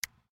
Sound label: printer switch; nyxteam printer